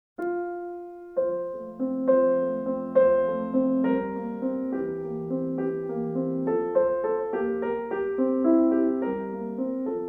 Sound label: Festive Sonatina Moderato piano